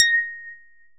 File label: plick